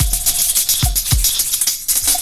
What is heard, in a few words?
PERCS, 70 s Breakbeats